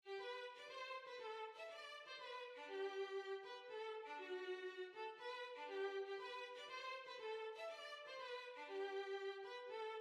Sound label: Waltz, Violin